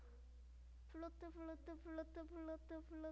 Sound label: flute